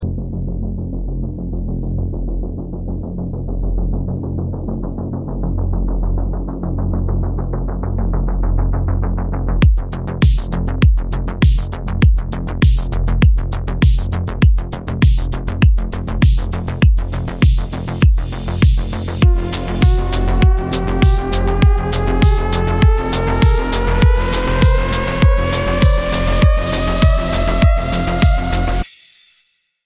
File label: dark retro groove